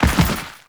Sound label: Land Dirt; Enemies; Footsteps; Warframe; Teshin